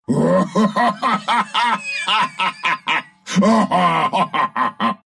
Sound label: laugh, ru; Sven; dota2